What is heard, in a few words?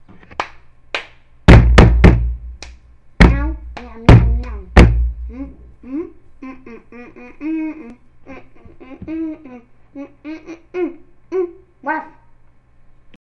drumming; תיפוף וזמזום